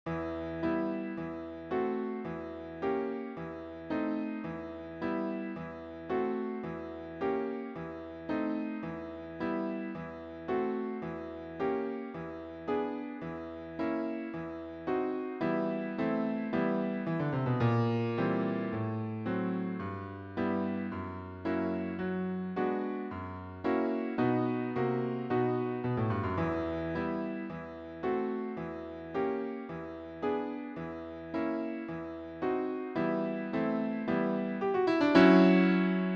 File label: Sugar Plum Fairy Duet secondo, Piano